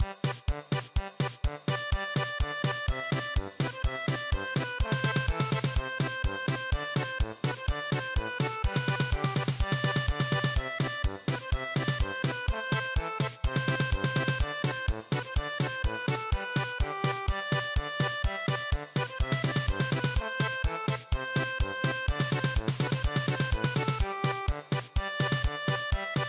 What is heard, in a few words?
traccas German Polka